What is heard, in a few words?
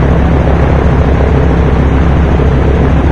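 helicopter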